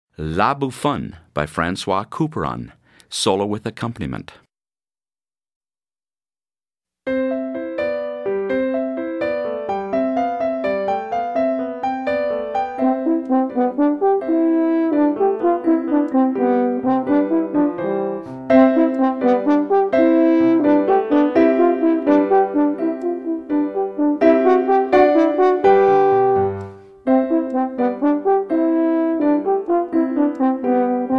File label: Horn Solo and Accomp; French Horn Solo with Piano Accompaniment; La Bouffonne